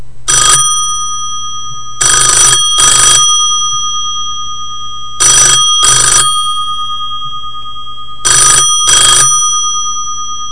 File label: model 500 telephone british ring